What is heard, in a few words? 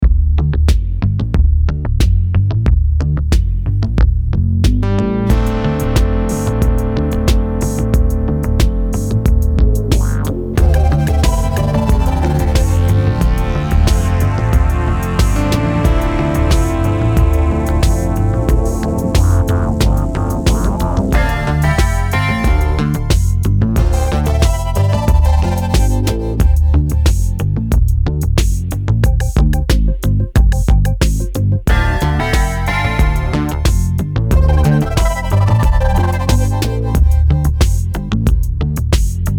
yamaha cs70 song